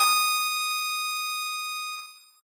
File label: piano